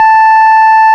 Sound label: ORG Farfisa Clarinet A 5